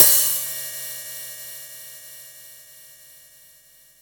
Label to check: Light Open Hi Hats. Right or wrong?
Right